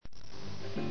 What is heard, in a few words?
Ferry